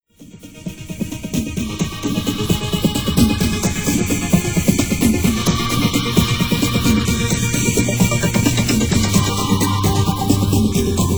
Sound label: Transdental Trance Mix Fade